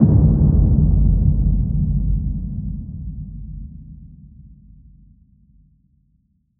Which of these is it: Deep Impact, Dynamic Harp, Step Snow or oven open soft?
Deep Impact